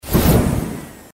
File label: propeller